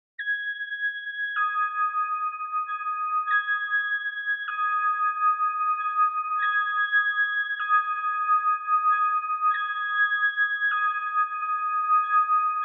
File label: 77 BPM B Min Lead; Cymatics; Destiny Gold Expansion; Light It Up